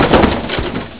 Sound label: trashcan